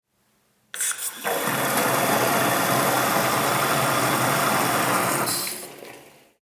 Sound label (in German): WC Spuelung